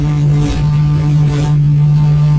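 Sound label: bee engine; vehicles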